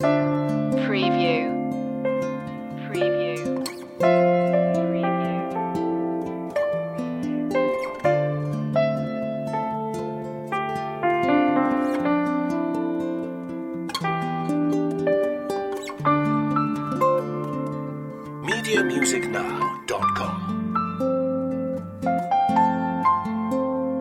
find calm loop

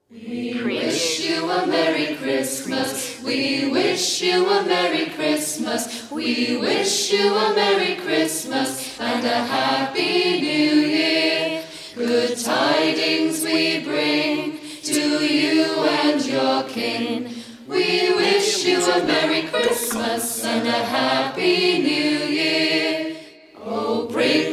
choir; we wish you a merry christmas

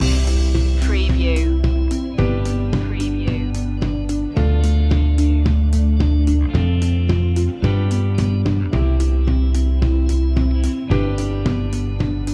winners attitude loop

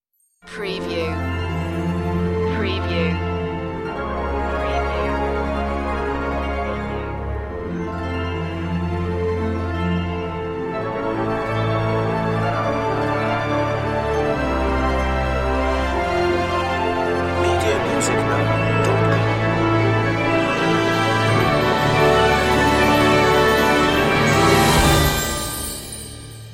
no choir

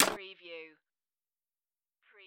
Pinball sound, ball hit metal object and move

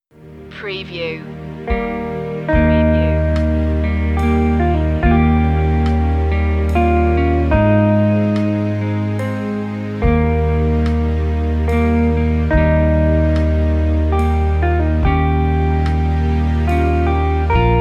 amazing grace regular verse 72bpm

